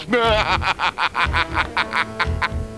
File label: chair laugh